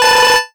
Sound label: Chippy Alarm; Ianstargem